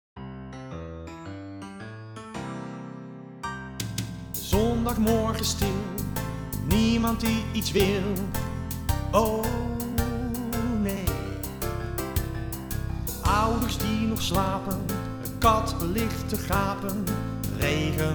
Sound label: Blues